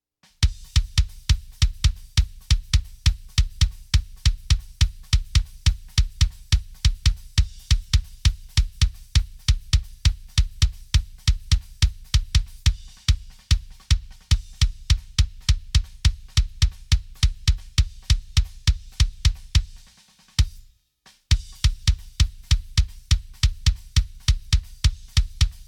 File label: Kick Drum; Multitrack